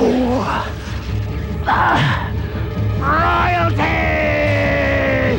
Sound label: death; quake2; inferno